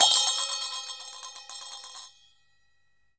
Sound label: CHINA SM3 R, Heart of Asia; Spectrasonics - Heart of Asia CD2